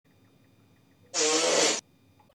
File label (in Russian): perdezh